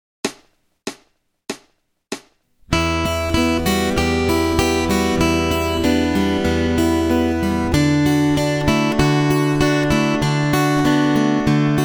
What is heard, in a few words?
Acoustic Accompaniment, acoustic guitar